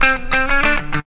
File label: GUITAR